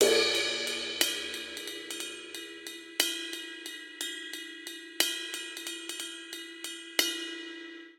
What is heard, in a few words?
Swing Six RnB Cymbals Only 6/8 90 bpm; Ableton; Factory Packs; Drum Booth